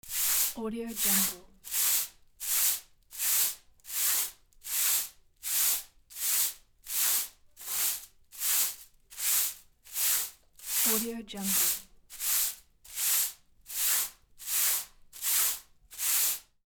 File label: sweeping